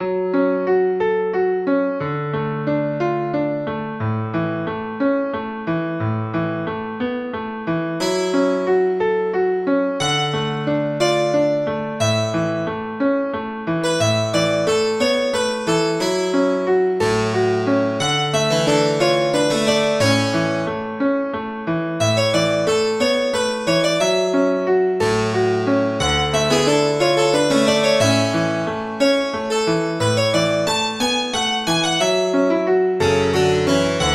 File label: harpsi sketch